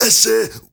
MALE SOLO, MALE NOISE, Heart of Africa

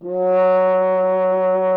F. Horn; Miroslav Vitous; Solo Instruments VOL-2

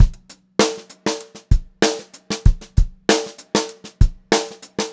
FUNKY BEAT, Funk-Groove Drumloops; USB Soundscan vol.38; AKAI

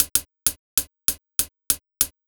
97 DRMS, Underground Hip Hop; USB Soundscan vol.02; AKAI

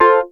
wurlitzer, dx7

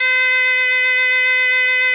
BOMBARDE C3, Celtic; USB Soundscan vol.42; AKAI

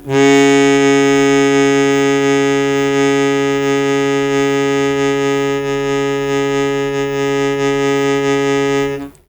Long Sustain Aa C#2, Harmonica; Zero G - Harmonica

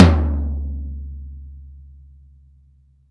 TOM E, Drum Dry; East Collexion; Drum 1

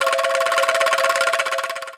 ANGKLUNGS; Voice Crystal - Steve Reid Definitive Percussion

